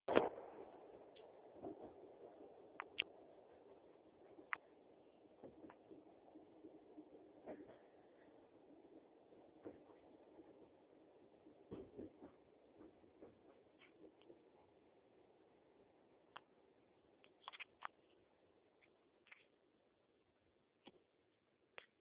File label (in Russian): поезд